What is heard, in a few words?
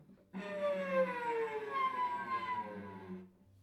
Violoncello Seagull